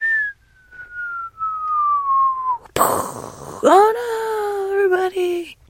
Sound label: trader whistling; 商人杰恩（七日杀）